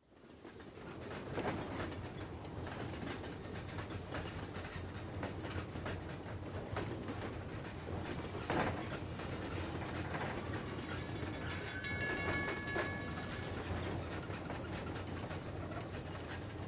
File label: Train Interior